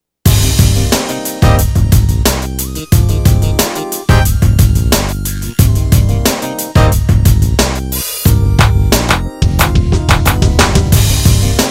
soul focus hiphop

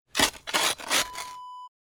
shovel hit dirt

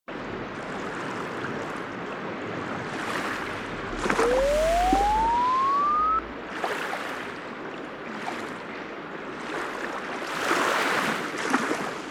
Light Sea Waves

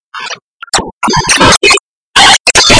Old computer starting

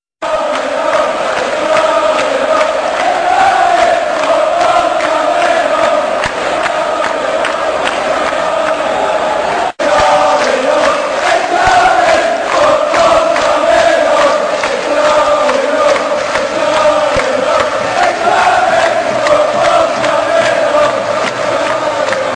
England, fan chants

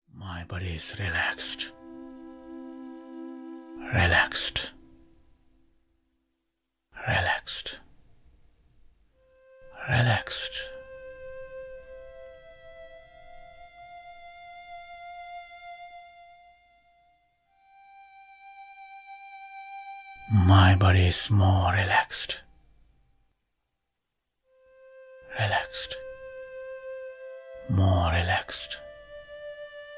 Meditation for Relaxation

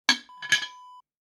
ceramic jar close

